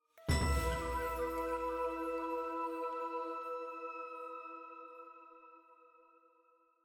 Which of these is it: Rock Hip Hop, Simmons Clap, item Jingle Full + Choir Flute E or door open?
item Jingle Full + Choir Flute E